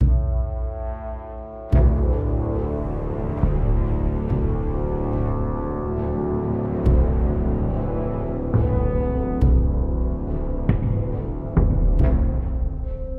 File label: Loop Fight